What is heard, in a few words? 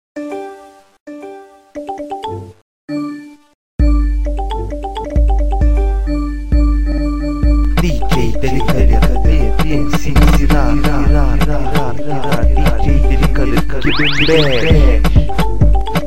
msn messenger remix